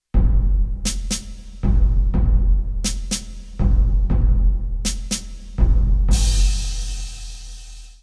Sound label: duel bgm start